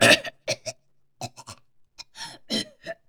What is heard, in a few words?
horror kubisime